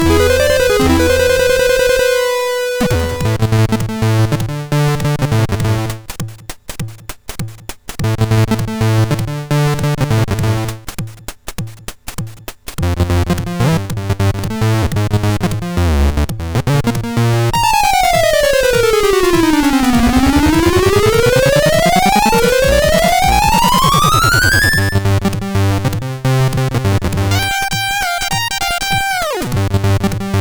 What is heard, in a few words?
tune, MOS6581R3